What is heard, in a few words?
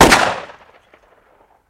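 357 Magnum Single Shot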